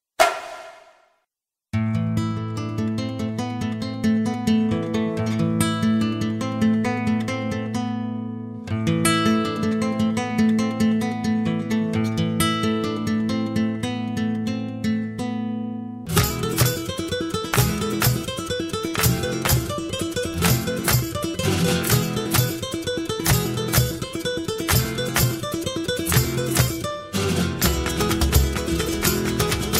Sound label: floor music; larisa iordache; 2012 olympics